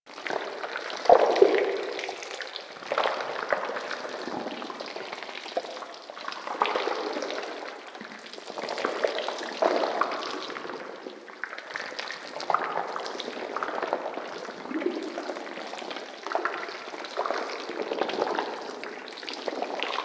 Gore Loop Thick Slow; Ambience; Props; Warframe; Infested Eris

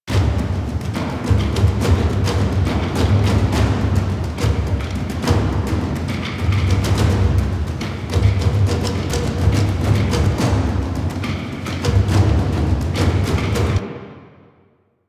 Projections Music Loop; Warframe

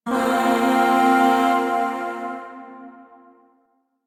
Zariman Void Shawzin Pent Min Chord

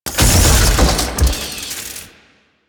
Blast Door Open; Ambience; Warframe; The New War; Quests; Zariman

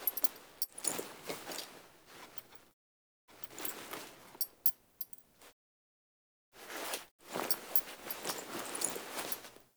Foley Action Acorn Plant; Characters; Player

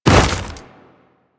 Step Ice, Footsteps; Enemies; Warframe; Grineer

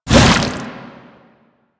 Shield Lancer Shield Swing; Warframe; Lasrian; Lasrian Soldiers; Weapons

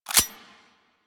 Hand Shotgun Reload

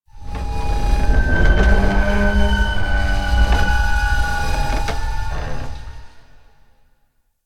Low Creaks, Asteroid; Ambience; Warframe; Grineer Asteroid

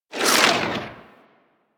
Rifle Projectile Tail; Weapons; Warframe; Corpus; Crp Ruban Rifle

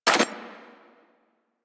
Rifle Mech; Weapons; Warframe; Thanotech

